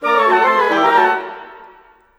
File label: Winds, Orchestral, Rock Pop; Sonic Foundry (Sony Creative Software); Orchestral Series VOL-4; Rock and Pop; Rock-Pop 23